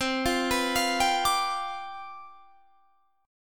C Major 7th Suspended 2nd Suspended 4th C

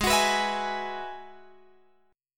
Ab Minor 7th Sharp 5th Ab, Guitar Drop D; down

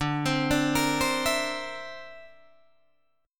D 7th Suspended 2nd Sharp 5th, Guitar Drop D

